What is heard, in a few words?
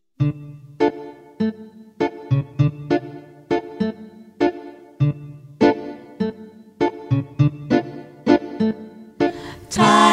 Tidy up tango